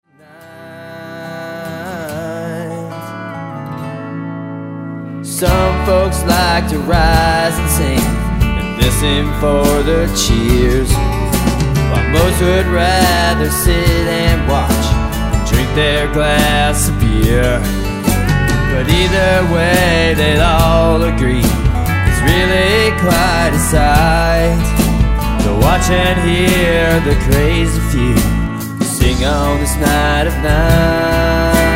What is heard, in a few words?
karaoke night